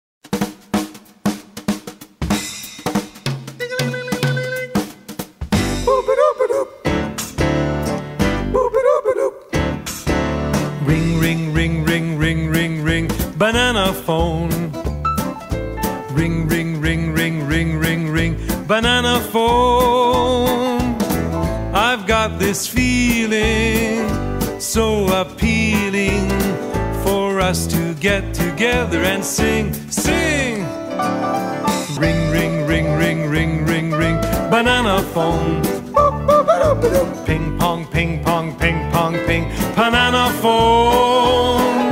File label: bananas phone